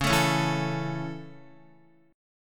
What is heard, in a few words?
Db Minor Sharp 5th Db down Guitar; strummed; Dbm#5; JGuitar